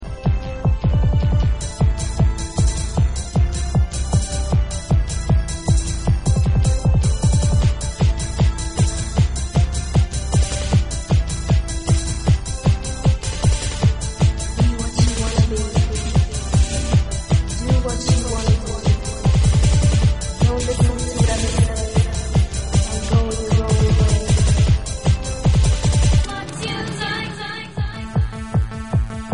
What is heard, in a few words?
tekkno power dub mix